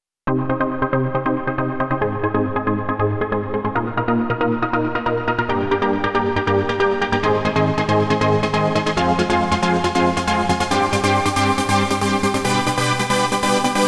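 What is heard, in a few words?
synth mix, ensemble reverb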